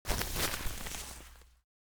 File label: paper fold